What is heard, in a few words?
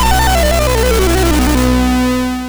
sid MOS6581R3; hvsc